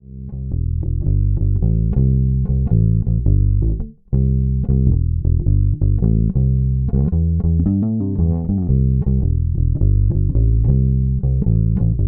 Fender 1965 Precision Bass Tone

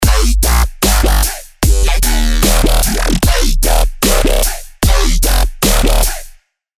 Nexus 3 Dubstep Electro Get Funky

